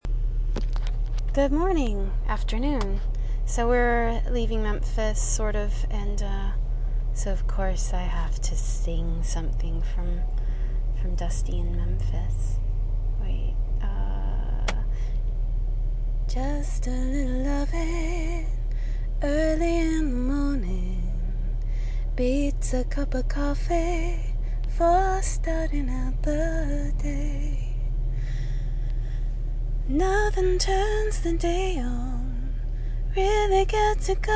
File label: boo singing; memphis